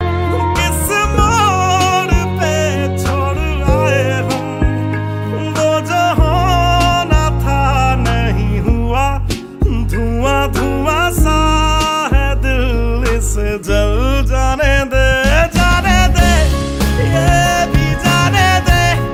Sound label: Punjabi Beats Ringtone